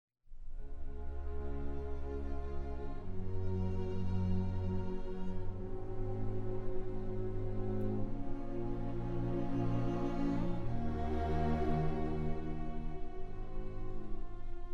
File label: Concertino für Tuba und Streichorchester - Mvt. 2. Romanza e Scherzino